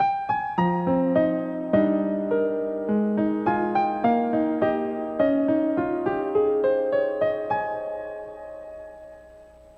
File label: Character Joined music